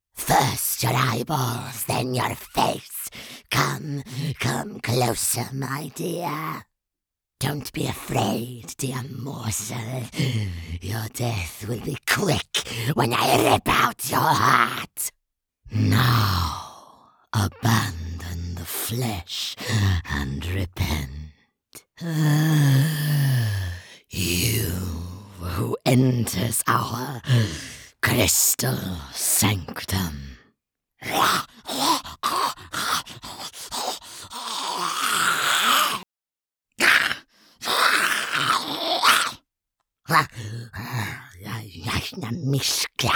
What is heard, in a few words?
Creatures